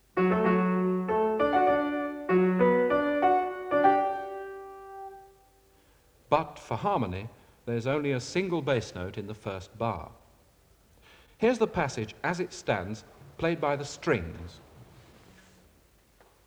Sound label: Music Example (Piano)